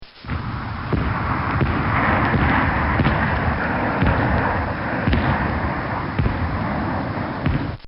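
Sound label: F-16 Falcon Drop 500 lb Bombs; Bobby Cole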